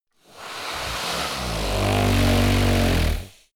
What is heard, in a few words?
monster snore breath low; koerpergeraeusche; comedy-cartoon; soundpool